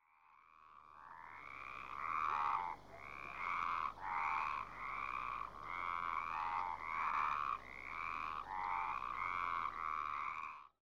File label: jungle frogs close up